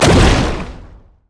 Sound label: elastic slingshot; LoL; zac base